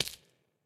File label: footsteps hive; Fate The Cursed King